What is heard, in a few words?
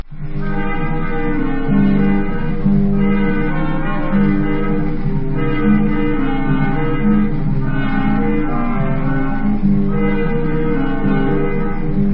hora, instrumental